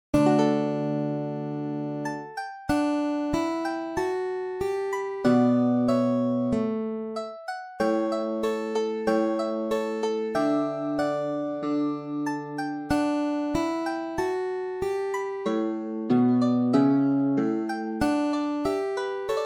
aria pour guitare

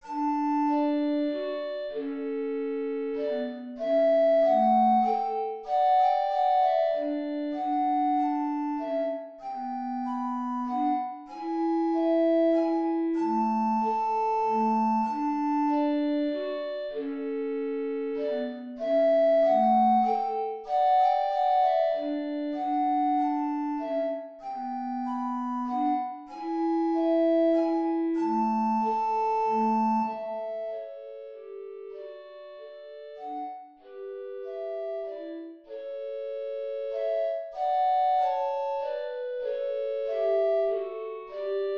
menuet majeur; purcell henry

